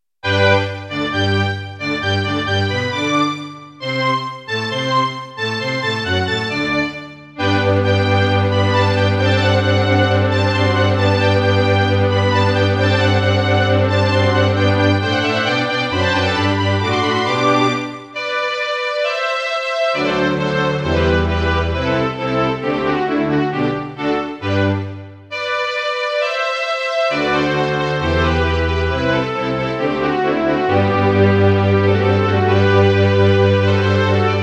Mozart - Eine Kleine Nachtmusik partial, Roland SD-90 GS MODE; classical